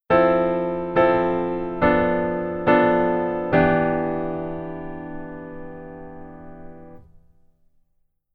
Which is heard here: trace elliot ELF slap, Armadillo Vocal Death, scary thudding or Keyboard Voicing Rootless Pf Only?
Keyboard Voicing Rootless Pf Only